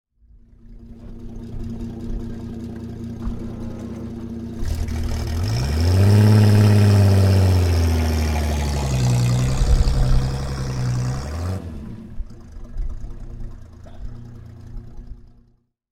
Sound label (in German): BMW 303 leicht erhoehte Drehzahl; von 1933